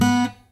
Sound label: pop, Guitar